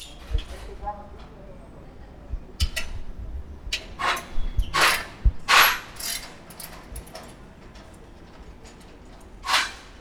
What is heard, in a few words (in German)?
Geruestbauer; ZOOM; RX